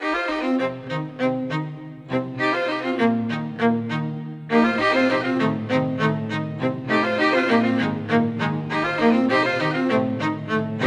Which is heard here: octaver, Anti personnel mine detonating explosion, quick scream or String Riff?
String Riff